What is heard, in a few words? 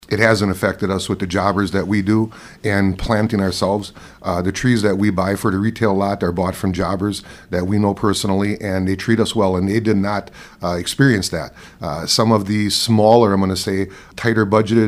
Drum